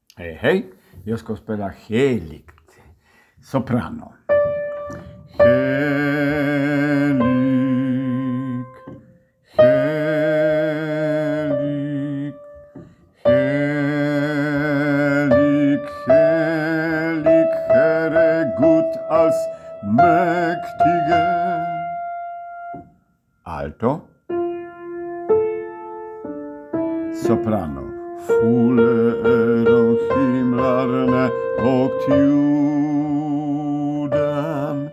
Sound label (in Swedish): sopran